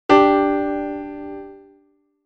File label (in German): Intervalle simultan